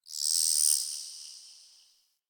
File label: Creature Distant High; Warframe; Infested Eris; Ambience; Outdoor